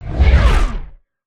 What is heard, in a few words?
asteroid whoosh by